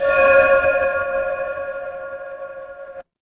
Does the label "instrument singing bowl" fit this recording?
Yes